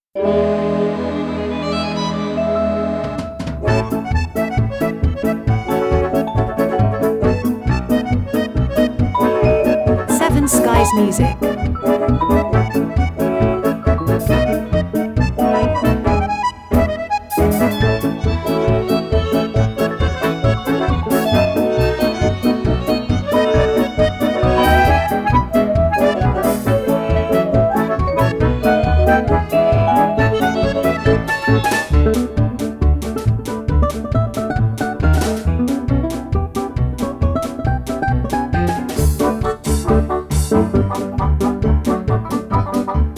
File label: Dixieland